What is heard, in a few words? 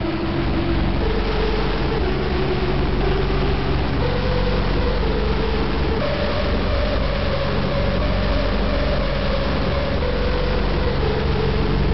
BGM slow